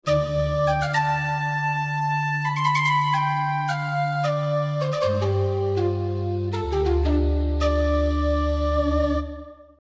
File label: shaku